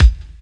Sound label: clean bass drum single shot a sharp key